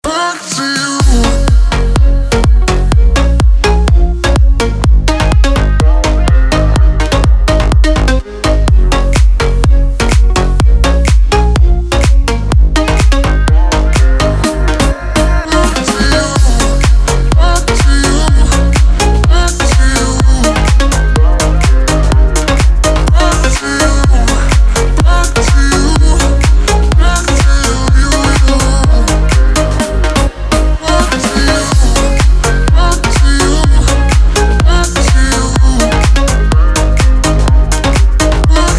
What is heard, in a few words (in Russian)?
Отбивка